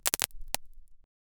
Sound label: short sting with nail cra